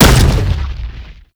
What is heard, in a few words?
Rupture Pillar Burst